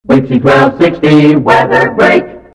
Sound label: WEATHER BREAK; WIXY